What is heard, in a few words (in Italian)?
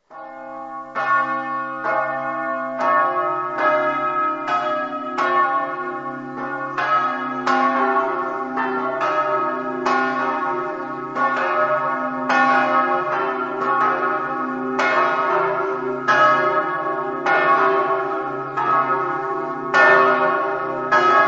caneva, campane